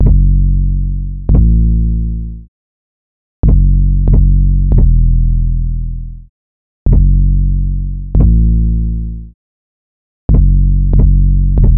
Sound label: 808 loop 140 F#m, Slate Digital BASSHOLE Sample Pack